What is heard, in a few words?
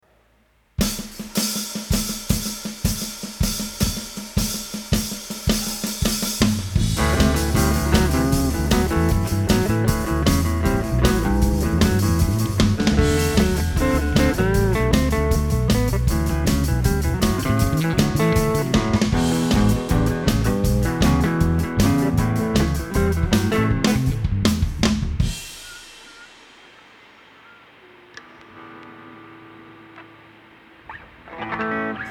Expensive Drum Samples Mix